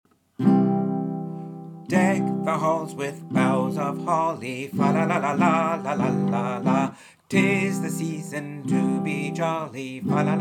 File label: in C; Deck Halls